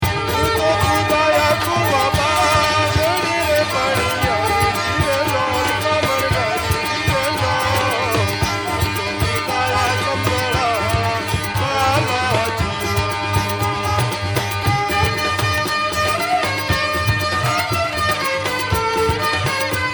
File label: banj